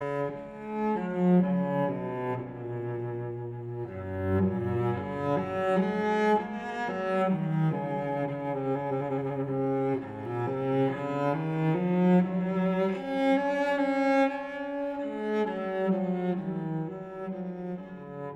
Sarabande Suite G major; BWV 1007

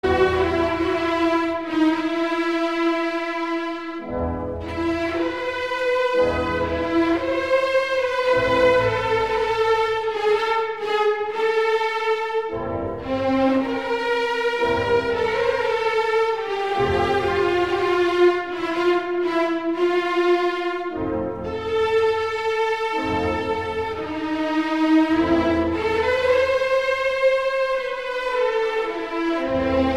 Ouverture; Suppe Von F.; Leichte Kavallerie

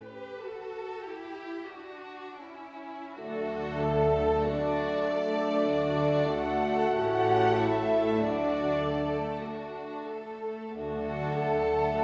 orch

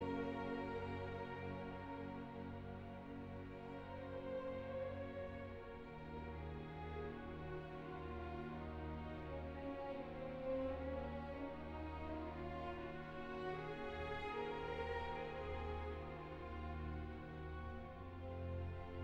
Adagio for strings

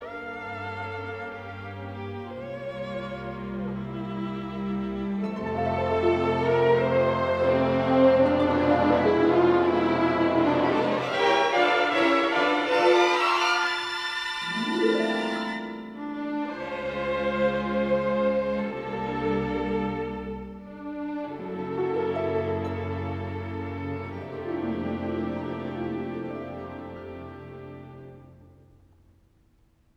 String Serenade

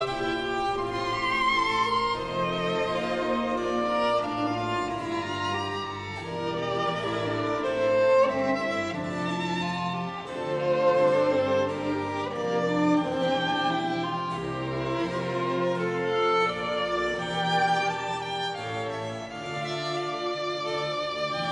Double viool concert in D Minor

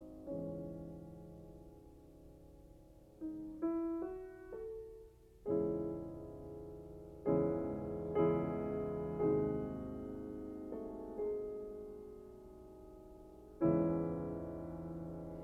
Adagio for piano in C major; Schubert; D760

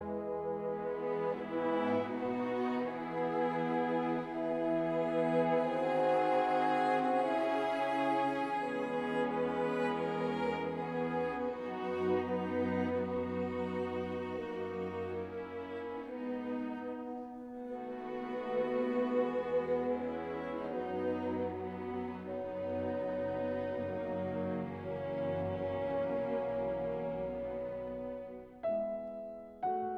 Andante, Concerto piano; Mendelssohn; Concerto No 1 piano

